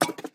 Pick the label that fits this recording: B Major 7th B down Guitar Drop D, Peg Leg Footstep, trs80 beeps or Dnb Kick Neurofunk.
Peg Leg Footstep